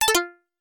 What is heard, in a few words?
Pause Cancel